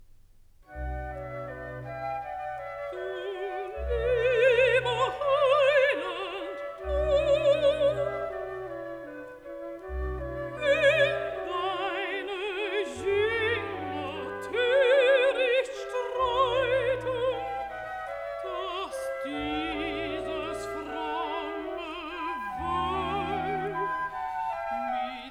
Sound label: Recitative (Alto); Du lieber Heiland du; Bach Matthäus-Passion BWV 244; Karl Richter (conductor)